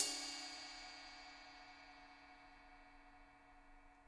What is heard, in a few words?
L RIDE 2 HI L, Interactive Drum Kits; Sonic Reality